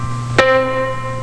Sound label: piano